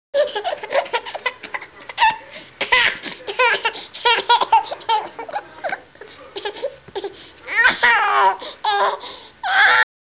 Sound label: laugh